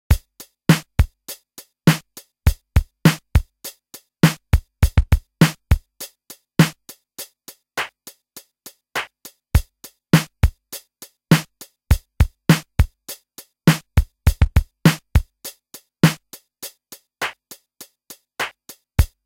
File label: DMX PATTERN